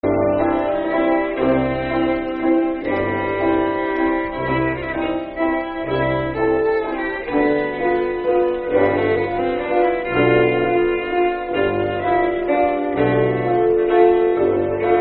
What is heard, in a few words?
Waltz; Ford C Bisbees Waltz